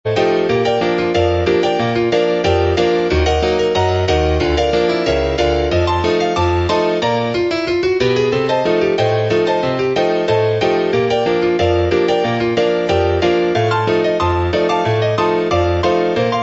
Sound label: Waltz; bg-music